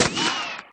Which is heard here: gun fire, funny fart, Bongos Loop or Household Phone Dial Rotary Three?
gun fire